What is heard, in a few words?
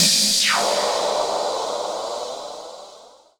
D+B